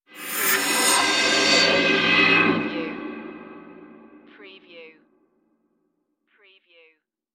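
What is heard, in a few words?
Production Element Cymbal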